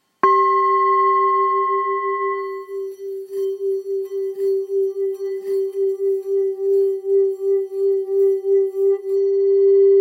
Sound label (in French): Bol ancien do 260g